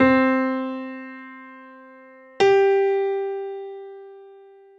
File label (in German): quinte, gehoerbildung